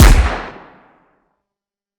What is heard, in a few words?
Gunshot